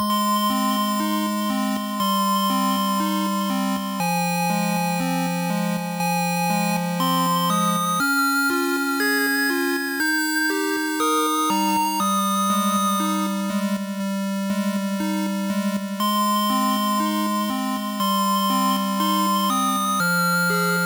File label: Game Start; Curse of the Crescent Isle DX